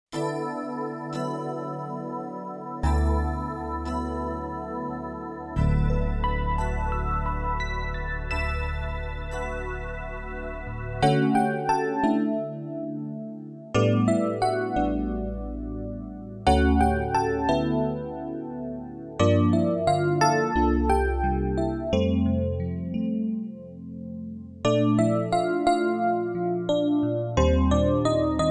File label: E Piano; YAMAHA P120 DEMO